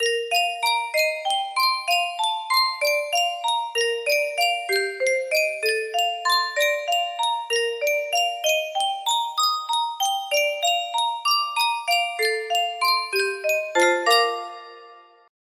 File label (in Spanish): sankyo custom tune caja de musica